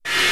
whine; svencoop

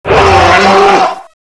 down; renesaur

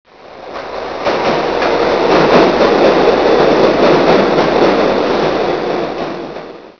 subway

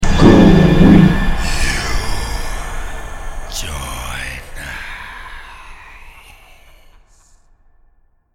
Zombie win; cstrike

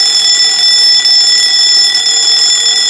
alarm; svencoop

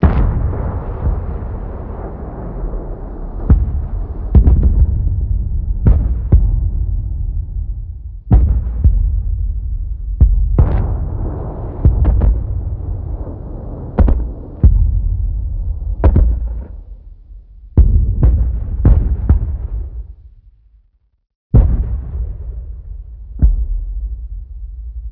damage boom loop; cstrike; aztec

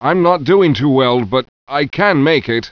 ro wound

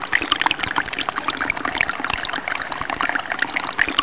ambient fontain; chaoscity

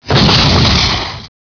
flyball hit; gearbox; flier

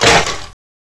wood; gearbox; debris

